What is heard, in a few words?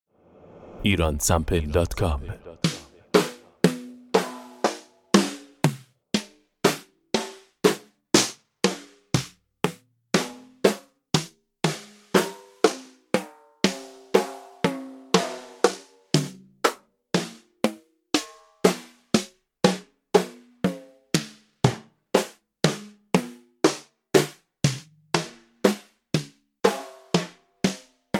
acoustic snare